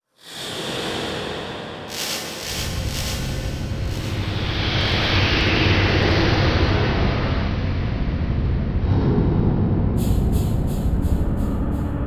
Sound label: Electronic Whoosh Reverse Feedback Digital; Module Production Element Title Imaging; Imaging Elements